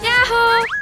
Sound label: yahoo